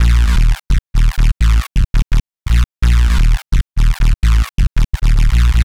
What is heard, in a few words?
Narf Bass, Drum n Bass; KJ Sawka; Drum n Bass & Beyond